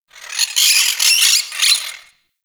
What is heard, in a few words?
Forest Creature Squawky High; Warframe; Grineer